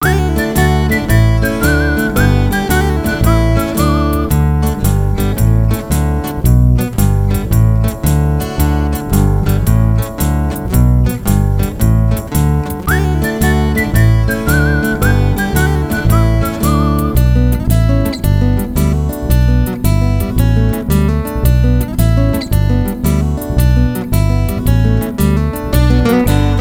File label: Instrumental